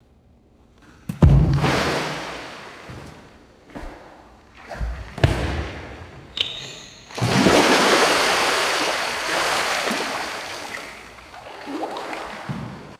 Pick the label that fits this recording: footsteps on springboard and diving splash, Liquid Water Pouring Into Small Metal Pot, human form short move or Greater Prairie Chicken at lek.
footsteps on springboard and diving splash